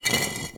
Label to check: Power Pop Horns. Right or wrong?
Wrong